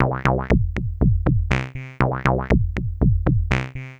Techno Synth

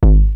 Roland TR-909 BD; BD Selection

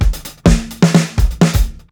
fill, breaks; LOOPS; Basement drums vol 2

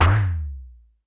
Roland U220 Electric Set, Drum Machines

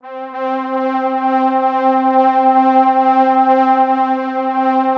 ST S STR C4, SYNTHS, House Side Of 2 Step; USB Soundscan vol.51; AKAI

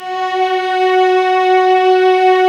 STR VAS, Amb f; Roland L-CD702; Vlas Sect3-4

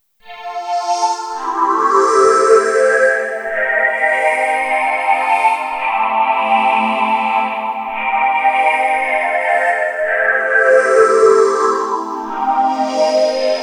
Wide Ancient Pads, Electro Lounge; Sonic Foundry (Sony Creative Software); 110 Kickin

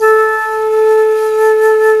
FLT C FLT, C Flt Brt; Roland LCDP04 Orchestral Winds; 90 s Sample CDs; C Flutes 3-8

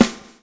swing

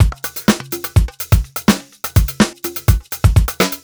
125 la fine beat, Nu Jazz House; 125 Beats

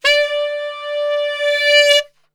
D 3 SAX, Brass Super Section; Best Service

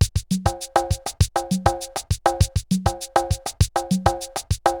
RX17 Perc (100BPM), cyborg beats; musicradar

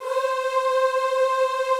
C4 R, Classical Choir; Best Service; Extended Classical Choir

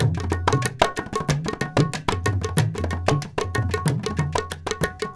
India, Heart of Asia

